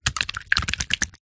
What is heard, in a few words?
cartoon slime gloop wobble movement